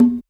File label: Conga; Equipped Music; Loungin House